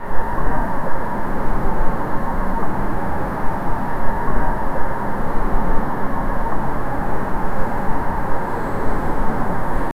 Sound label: ominous quiet urban night; nighttime